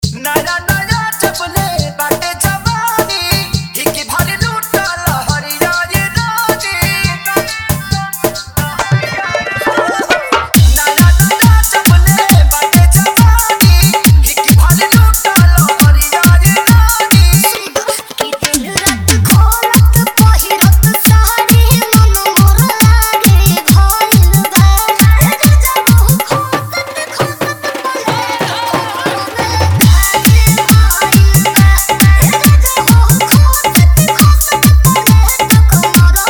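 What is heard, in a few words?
Hard Dhollki Bass Remix, Bhojpuri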